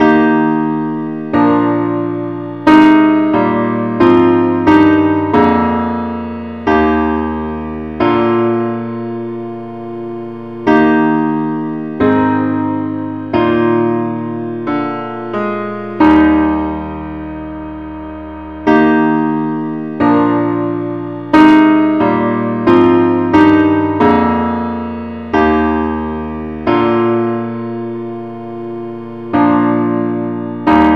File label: parts only key ees; Gwalchmai; Glory be to God on high